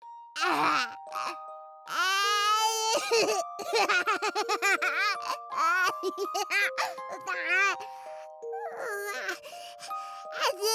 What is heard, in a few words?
animation women baby sounds